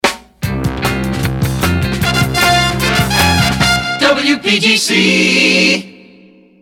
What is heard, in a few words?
jingles resings downbeat; pams series 42a